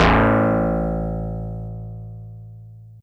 Easy Clav DX100 A1

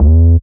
E Bendy Bassy

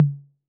Tom Mid B 808, Clean, Digital

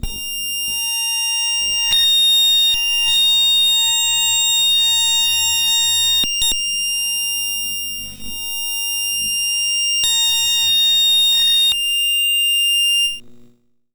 Sid C8; Flasch Bier

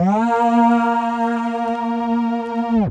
VP330 Combo Pitch Mod Vinyl Synths A#2; vinyl keys

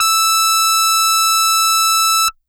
Hard Brass DX100 E6

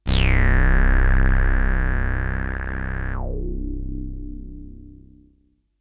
BASS

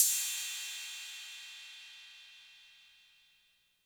Cymbal 808 Color

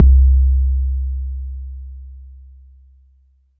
BD; TRX

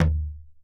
Tom Acoustic Crush Lo Chorus

